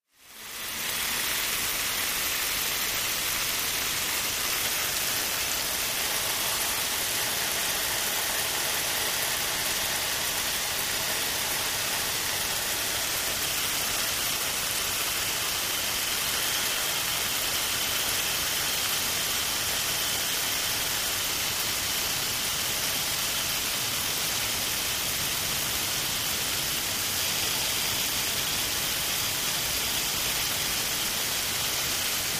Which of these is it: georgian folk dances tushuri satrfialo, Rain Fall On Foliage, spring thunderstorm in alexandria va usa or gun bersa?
Rain Fall On Foliage